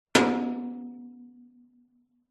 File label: impacts metal drum thin light resonate